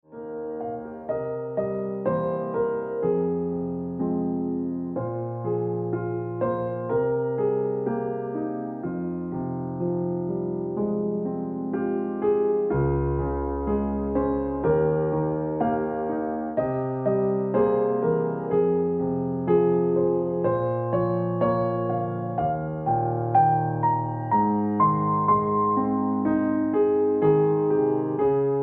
Ringtone Piano Music

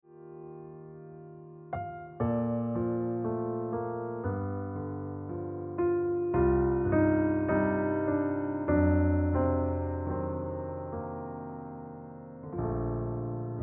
Piano Music

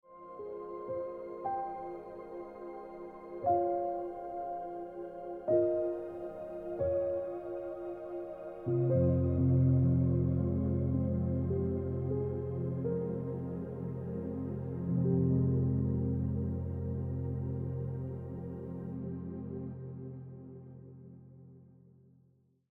Piano Music

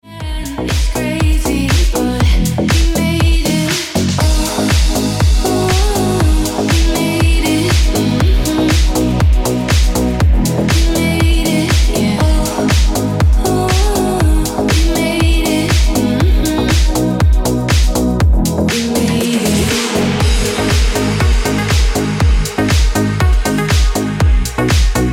Ringtone Deep House Music

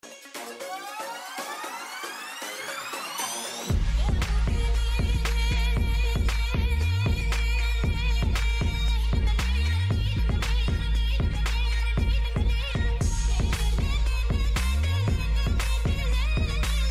Kurdish Trap Remix Turkey Ringtone

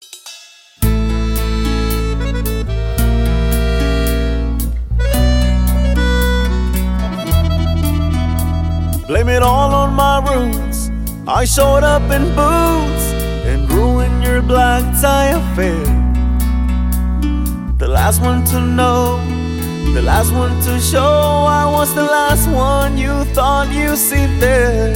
Mexican Ringtone

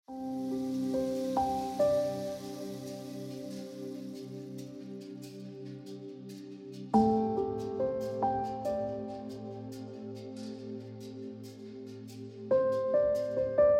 Ringtone

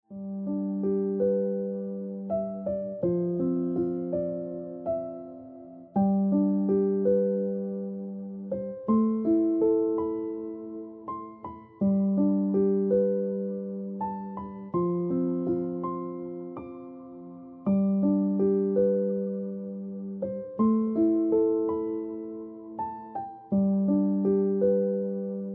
Ringtone Piano Music

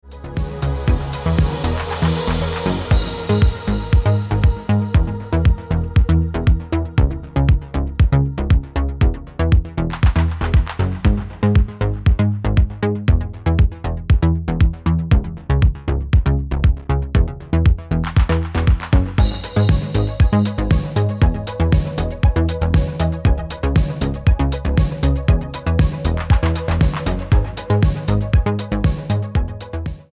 Ringtone

